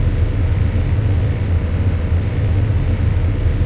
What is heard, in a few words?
leo2a5 engine int rpm 99, tanks; War Thunder